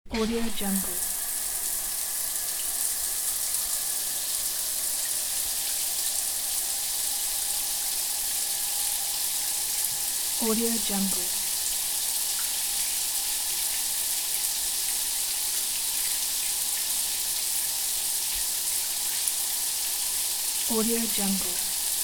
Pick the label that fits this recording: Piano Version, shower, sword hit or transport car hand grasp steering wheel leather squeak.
shower